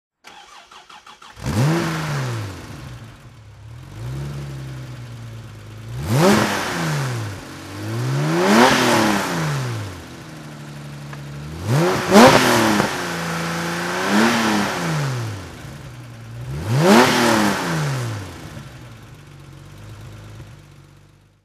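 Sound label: porsche 911 carrera 36L throttle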